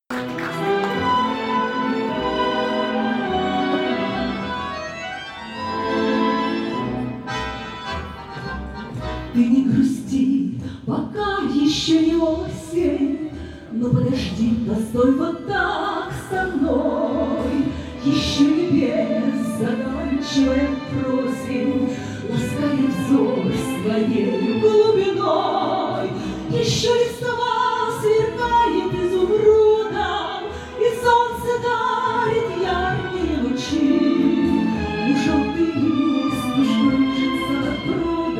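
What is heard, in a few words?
piano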